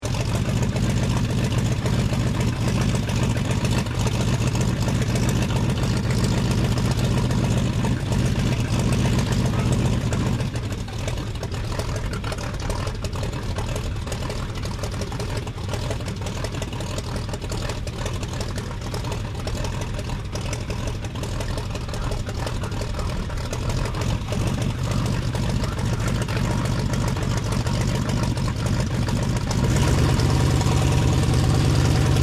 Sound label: AIRCRAFT 1916 SOPWITH PUP REPLICA IDLE TAXI MIC A; Replica of a 1916 Sopwith Pup; Airplane; Airplanes; Vehicles